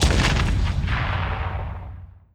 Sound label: orb Pulse; netent